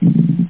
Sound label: SOFT; wincraps